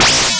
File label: power up; SMB3Memes; EoSD Chiptune